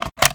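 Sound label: sniper reload mag in; gmod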